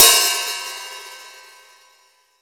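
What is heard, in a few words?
open hi hat, 14 inch old hi hat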